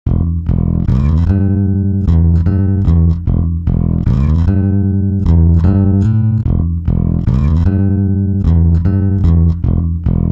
bass 133; i3 dsp quattro x; timestretch

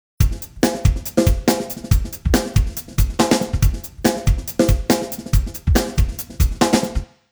drums, time stretch; bias peak

